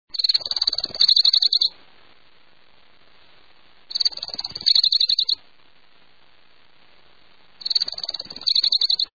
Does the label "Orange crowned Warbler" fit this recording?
Yes